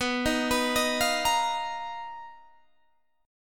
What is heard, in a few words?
B Major Sharp 11th, Guitar, DADGAD